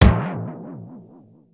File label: umbrella; Cartoon Game Sound 2.0; 카툰 게임 사운드